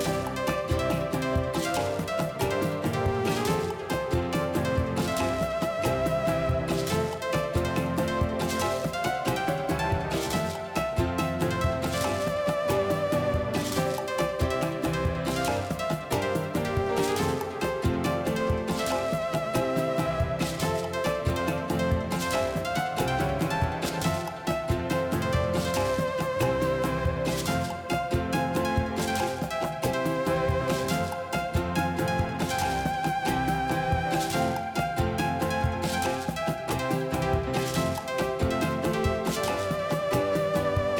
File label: Space happy; Pbff Music#53 (Bgm Str 08 Spacehappy)